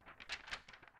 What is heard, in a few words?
page turn